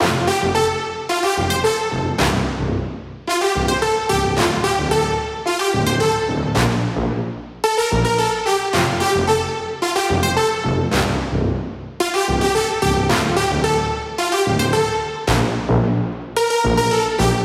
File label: Brass Loop 110BPM Fmaj; Brasses; Instrument Loops; 99Sounds; Monosounds